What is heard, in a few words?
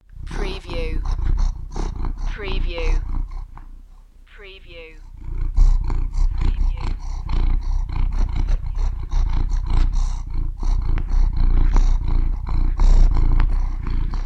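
Cat Deep Purr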